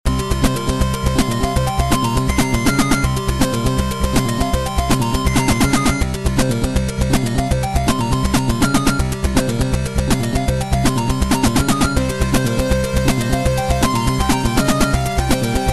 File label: space legend battle; P3D-Legacy; 2.5DHero